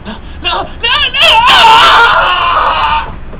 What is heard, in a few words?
scream